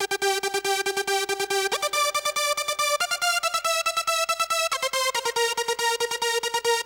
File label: Tranc C4, Melody; Techno; TechnoMusicMaker